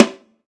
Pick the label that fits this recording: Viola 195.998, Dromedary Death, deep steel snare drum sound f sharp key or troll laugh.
deep steel snare drum sound f sharp key